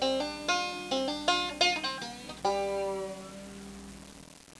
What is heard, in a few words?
ending, banjo